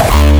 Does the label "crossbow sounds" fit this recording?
No